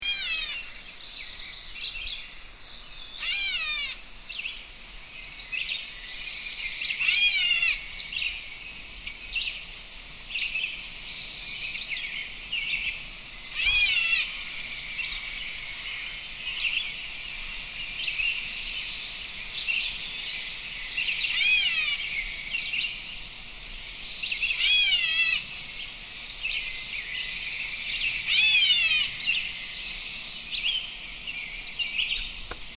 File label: Gray Catbird Meow